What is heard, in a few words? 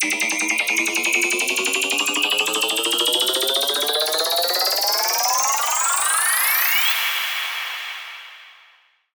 Riser